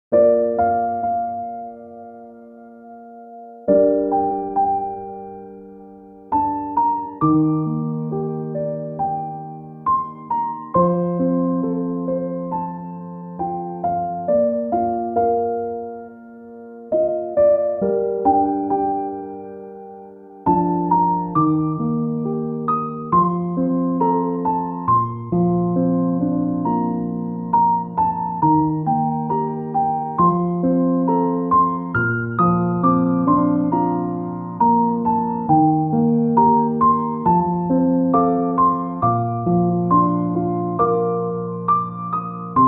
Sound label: romantic piano